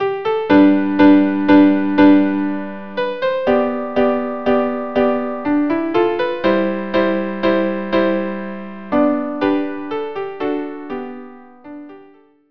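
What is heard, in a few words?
violin violin duo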